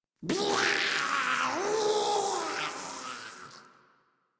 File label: Arm Pain; en; Warframe; Grineer; Ghoul